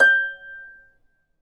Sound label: G5 HARP MF-L, Complete Harps; USB Soundscan vol.62; AKAI